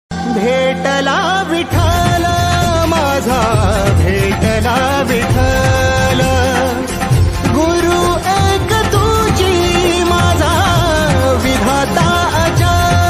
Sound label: marathi ringtone; vitthal